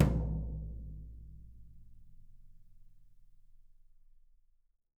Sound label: YAM18 BRUS, brush drums; Big Fish Audio; Ross Garfield Drums VOL-2; T-YAM BRUSH